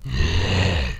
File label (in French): grognement de bête